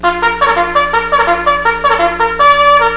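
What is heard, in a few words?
reveille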